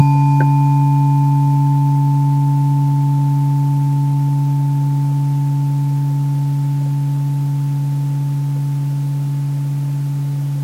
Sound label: throat, tuning forks chakra weighted; unweighted